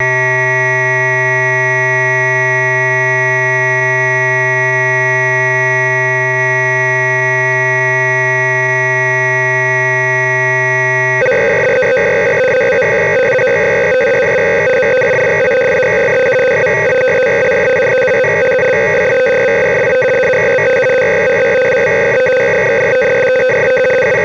Sequential Circuits Prophet 5 factory presets B, Cassette Interface; Synthesizer Factory Patches